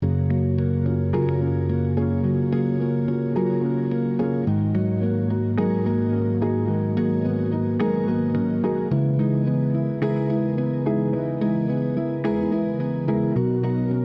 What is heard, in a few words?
tech arp progress